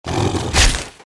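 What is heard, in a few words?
Tiger attack Mid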